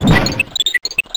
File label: tweet; Geschicklichkeit; Hamsterball